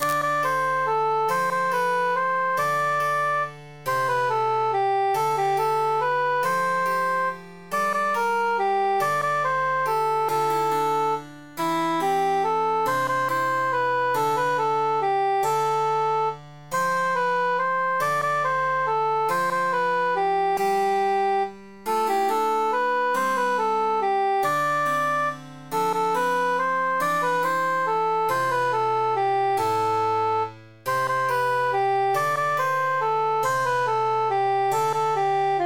cantus emphasized; pucelete

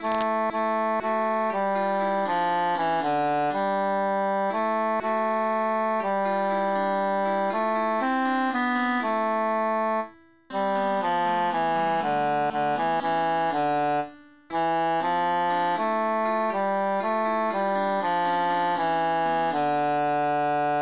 tenor emphasized; la bionda trecca

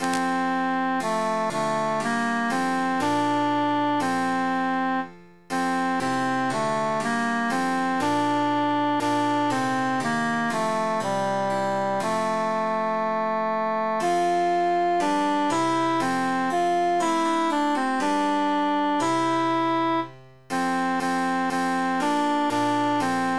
tenor emphasized; o occhi

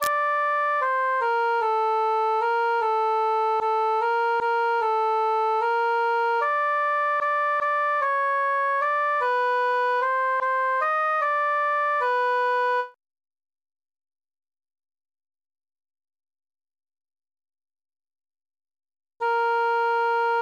cantus solo; fa una canzona

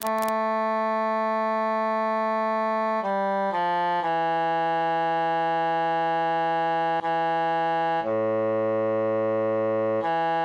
bassus solo; weep o mine eyes

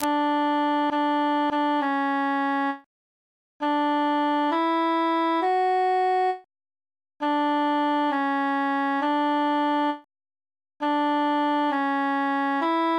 transposed tenor solo

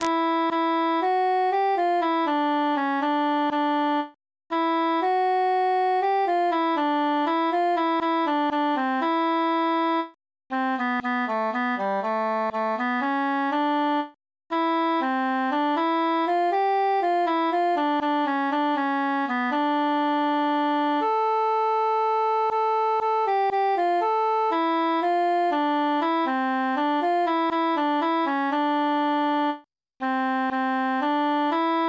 tenor mute; la bionda trecca

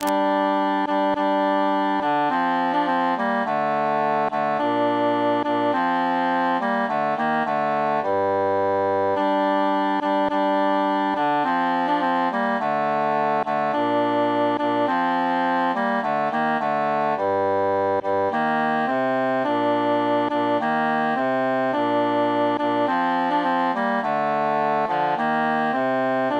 cantus mute; pastime with good company

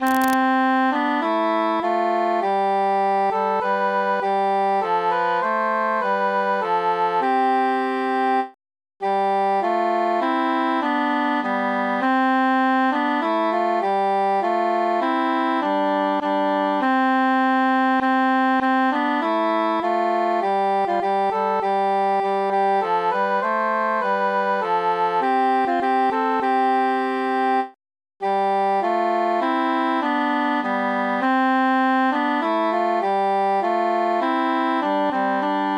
altus mute; we be three poore mariners

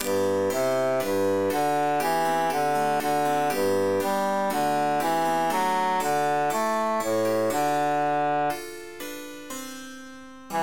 bassus emphasized

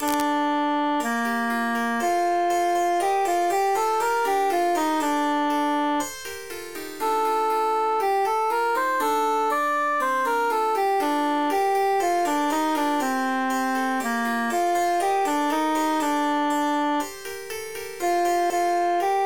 transposed bassus emphasized; iacob scalam

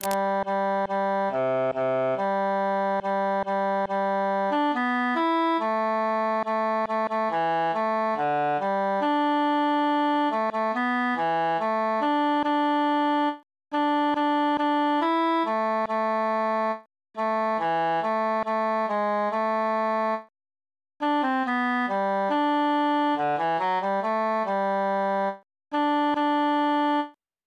tenor solo; now is the month of maying

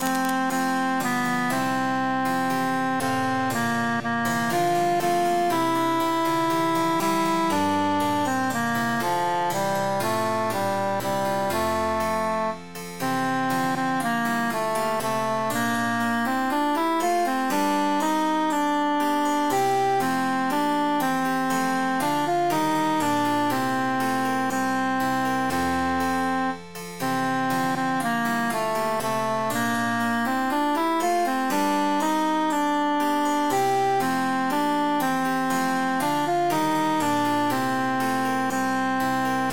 altus emphasized; silver swan

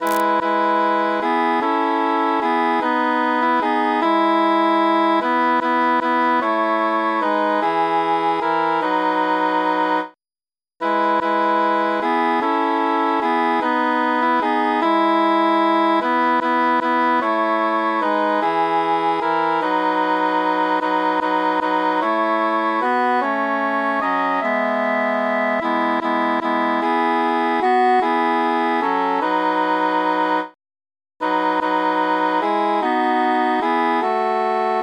transposed bassus mute; of all the birds

